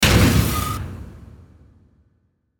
Hit Metallic Burst Electrical; Blastwave

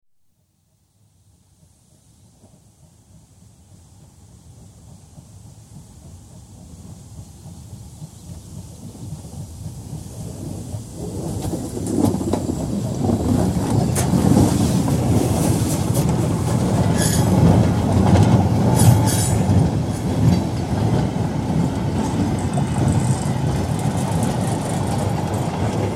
Steam Goods Train passes left to right; Trains (Sound Effects:Vehicles); BBC

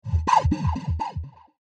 Alien Jitter Bug; Alien Sound Effects; science fiction sound effect; Andrew Fleming

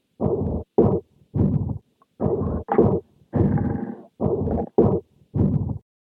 Future Factory, science fiction sound effect

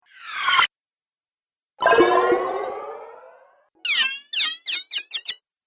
Various Game Sounds, video game sound effects

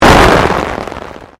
Convolution Pulse

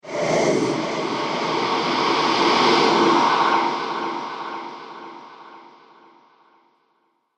Consumed By Ghosts, ghost sound effects; horror

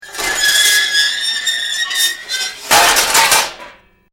Metal Screech Intense High Pitch; Nasty metal grind screech, ear splitting screeching and clanking; Metal Sound Effects

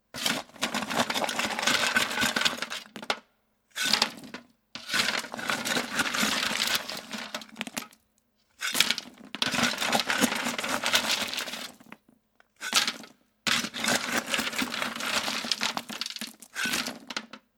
Shovelling Coal; Rocks; Materials

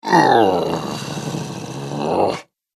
Ogre Growl; horror; Monster Sound Effects

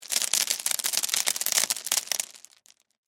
Crash Stones Debris, Crash, Stones, Debris, Spill; Rocks; Materials; BlastWave

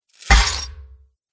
Warhammer Strikes Chainmail; Battle Sound Effects

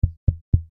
Microphone Tap, Microphone Tap Three Times Test; Microphones; Technology; Blastwave

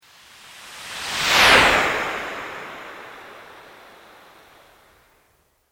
Sweep Organic Slow

